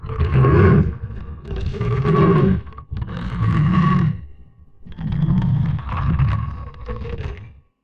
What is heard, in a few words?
roaring boar